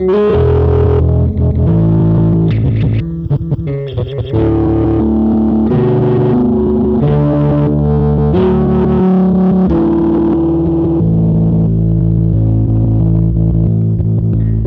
Processed Electric Bass

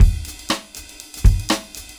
Breakbeats loop, 70_s Breakbeats

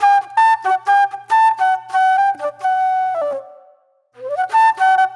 Mellow Flute; Sonic Foundry (Sony Creative Software); Manhattan Flutes; Mixed Berry Jam

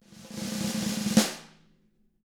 SNARE ROLL; ILIO; Double Platinum Drums 1; SNARE ROLLS

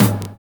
LOW TOM O; Univers Sons - Kitch 70s

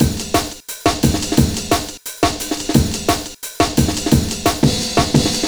breakcore breaks

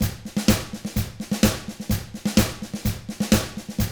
127 Drums

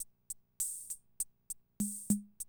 CR78, DrumLoops

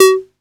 808, CONGA, Electronic; Wizoo - Platinum 24 Electronic; CONGA MENU

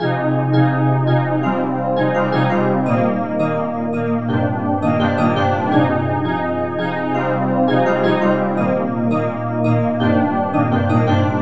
PAD, Drum & Bass; Best Service ProSamples vol.03 - Drum & Bass 1; AKAI

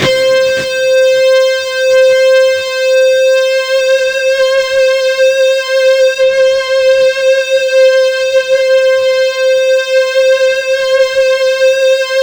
C4, Synth; 90 s Sample CDs; NiceBeats; SynthLine VOL-15

